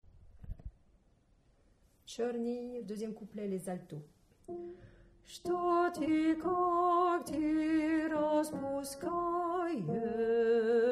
Alto

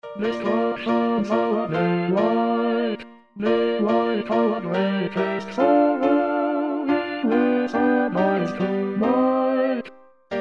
destructions our delight, Basse

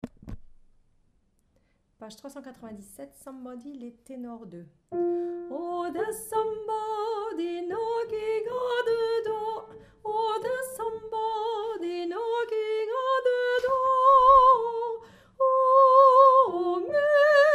Tenor

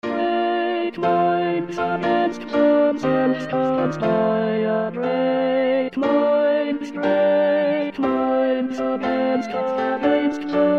great minds Tenor; Purcell - Didon & Enée

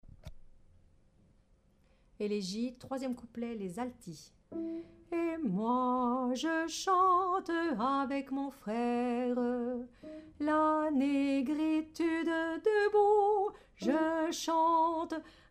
Alto

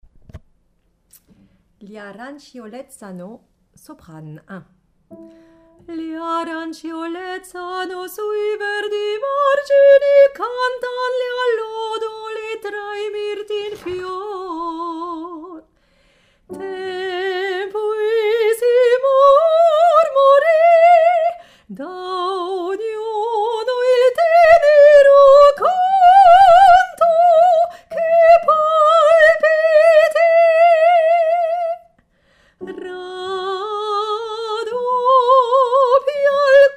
Soprano

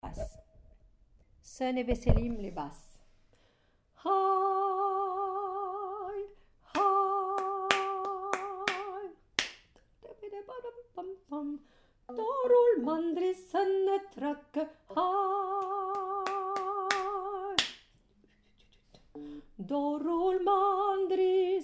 Basse; veselim

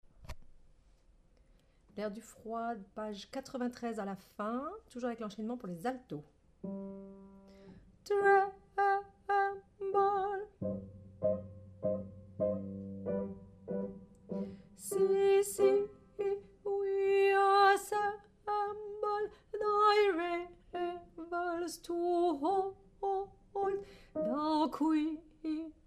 Alto

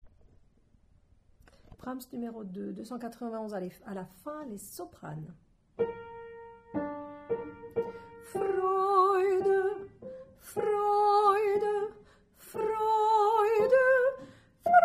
fin Soprano

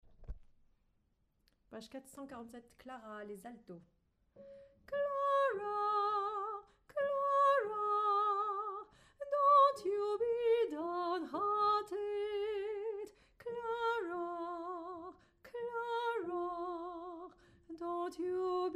Alto

